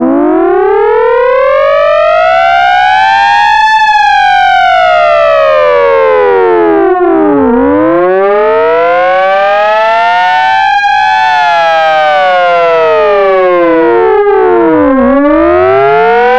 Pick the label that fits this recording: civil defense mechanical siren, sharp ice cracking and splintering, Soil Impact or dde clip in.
civil defense mechanical siren